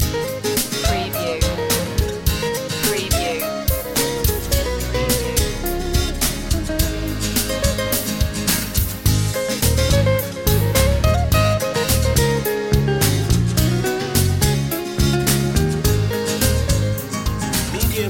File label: guitar slinger loop